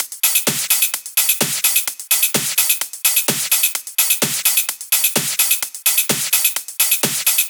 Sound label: Loops 128BPM, Drumloops